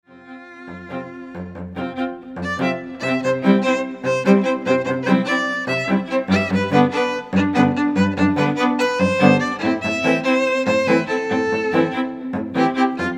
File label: trio